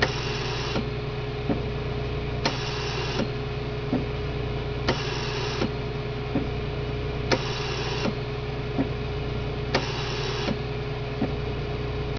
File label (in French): locomotive vapeur; OpenBVE; Railway; Provence